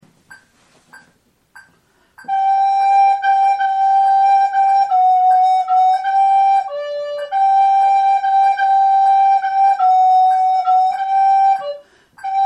Gavotte, FLUTE; caroubel

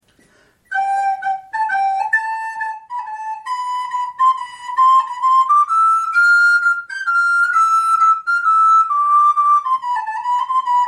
danse papillons, flute

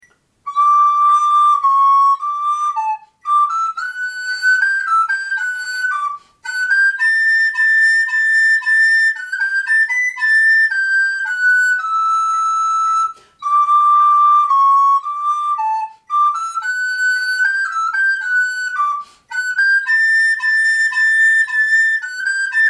menuet de trompette, FLUTE

